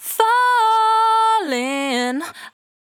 68bpm D, Velvet Vocal; Zero-G

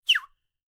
WHISTL; Spectrasonics Vocal Planet; Jazz FX

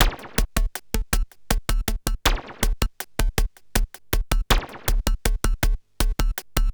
Beats, Industrial

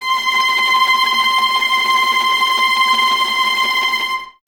Violas Tremolo C 5, String Ensembles; Miroslav Vitous

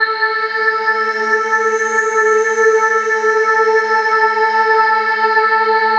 Dream Pad (single note)

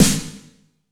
AMBIENT S9; Masterbits - TR-Minator

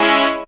tuners

